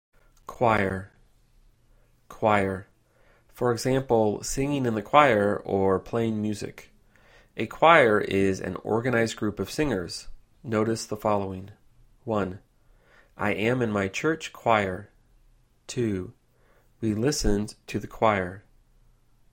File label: choir